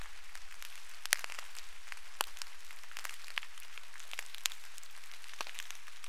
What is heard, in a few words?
snapping shrimp; sanctsound